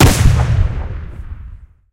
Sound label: mollins 57mm shot, guns